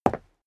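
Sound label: step sneaker concrete single left, footsteps; schritte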